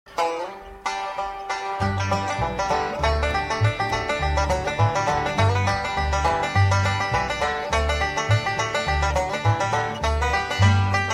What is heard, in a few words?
hot corn banjo; Country